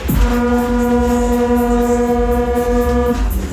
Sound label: Hooooorn, Kermis Horns